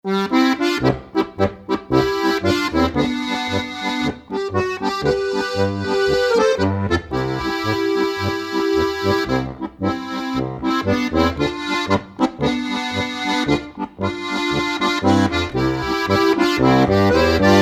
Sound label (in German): GCFB Lied, harmonika